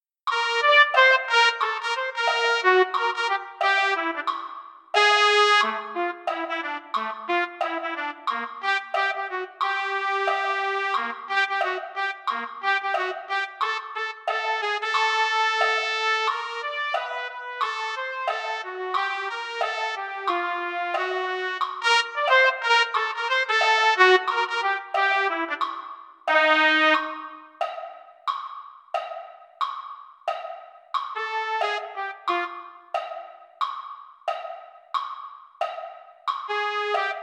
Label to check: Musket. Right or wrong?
Wrong